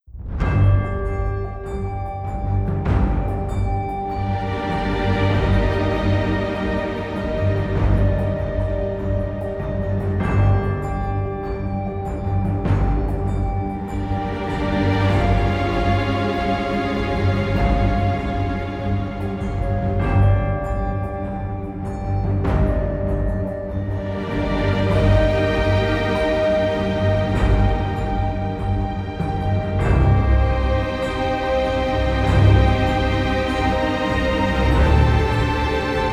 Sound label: Ice Dungeon Intro no harp